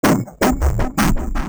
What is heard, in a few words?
DRUMLOOP D+B 160, Drum+Bass Loop; eJay